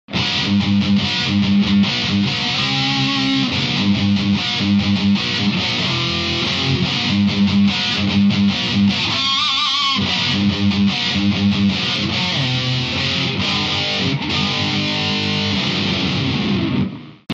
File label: tubeman